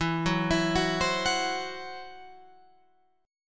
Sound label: E Suspended 2nd Sharp 5th E, Guitar, Open F, Esus2#5 chord; 16,13,16,13,15,13; arpeggiated; JGuitar